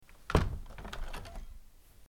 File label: hopper window closing